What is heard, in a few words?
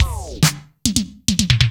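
DRUMLOOP ELECTRO 140; Techno2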